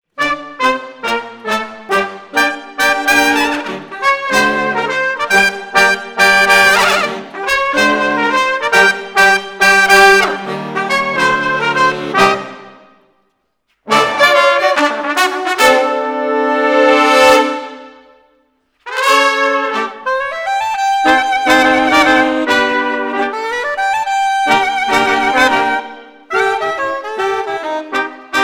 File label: Brass, Jazz for piano